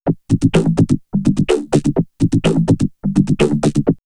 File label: Vocoder Loop; X-Static Goldmine; VocoderLoops